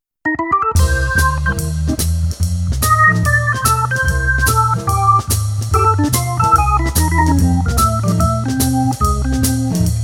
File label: organ jazz